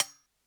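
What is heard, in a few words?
closed hi-hat; eddyflux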